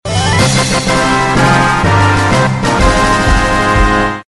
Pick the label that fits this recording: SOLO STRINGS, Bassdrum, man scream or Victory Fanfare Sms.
Victory Fanfare Sms